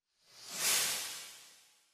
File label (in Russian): fei zvuki